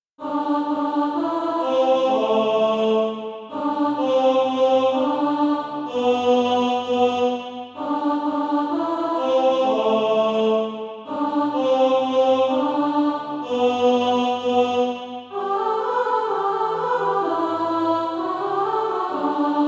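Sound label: alto, SATB Practise Music; Ding Dong Merrily on High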